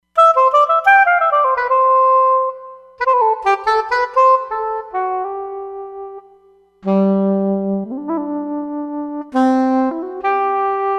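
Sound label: Solo Soprano Sax, Roland Fantom XR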